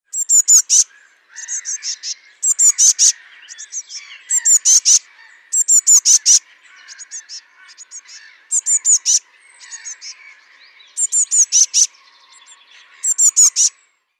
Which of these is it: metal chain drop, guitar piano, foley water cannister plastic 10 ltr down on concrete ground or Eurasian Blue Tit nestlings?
Eurasian Blue Tit nestlings